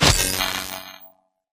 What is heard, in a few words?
Radar Jammer Jammed Start; Boost Card; Titanfall 2